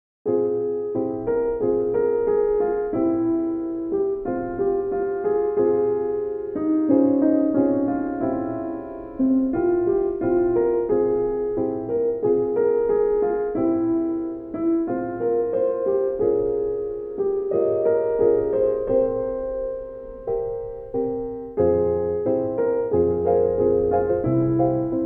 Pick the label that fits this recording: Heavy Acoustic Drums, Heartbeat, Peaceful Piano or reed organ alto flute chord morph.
Peaceful Piano